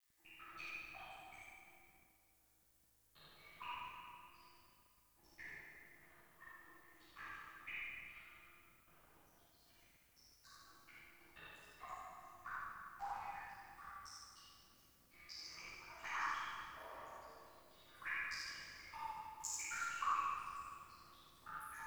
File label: Cave Drips; Ambience; Duviri